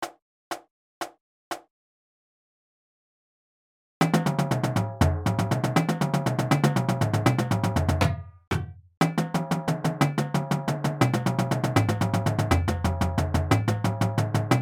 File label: Paradiddle, Drumline Warmups